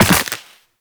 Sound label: Land Soft Ice, Footsteps; Characters; Operator